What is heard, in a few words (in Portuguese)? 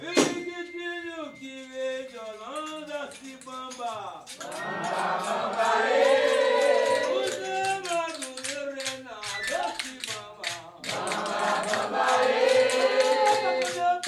fanti baiao de princesas periquitinho; Baião de Princesas é um ritual especialíssimo e único que acontece apenas uma vez ao ano